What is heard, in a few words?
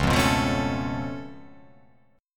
C sharp Augmented 7th, C sharp, down